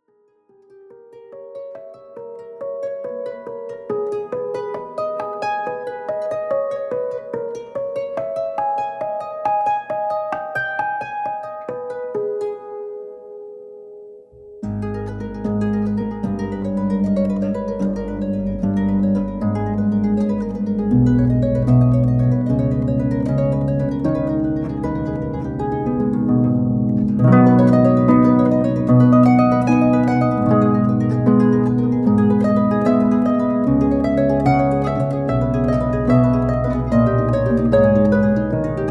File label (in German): Welsh Melodie, Harfe; Klanghaus Eibelstadt